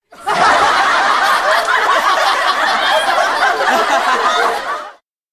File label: crowd laugh; anemoi